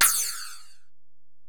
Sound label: Ride, Alesis DM-PRO, Drum Machines